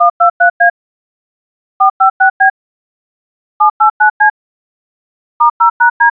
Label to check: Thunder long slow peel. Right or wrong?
Wrong